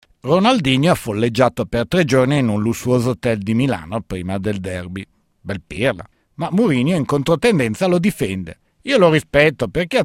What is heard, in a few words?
samba